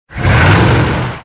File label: truck accel; Soldier Of Fortune II Double Helix; XBOX; ambience